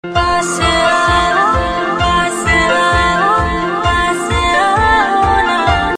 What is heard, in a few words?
Msg Tone